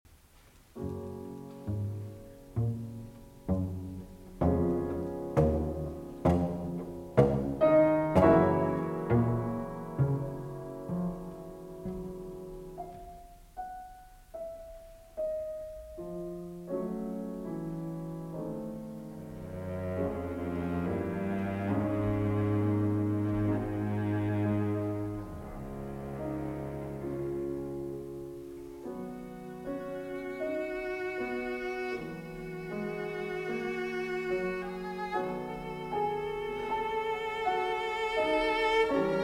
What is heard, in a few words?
Cello Sonata No. 2 in F Major II. Adagio Affettuoso (Live); Brahms; Op. 99